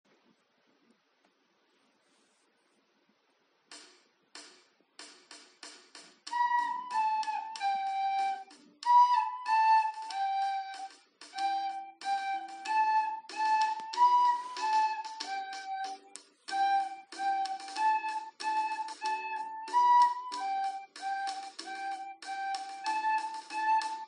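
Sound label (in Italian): esercizio flauto; SI LA SOL; musica attivita svolta durante la didattica a distanza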